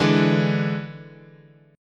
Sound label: D Suspended 2nd Flat 5th, chord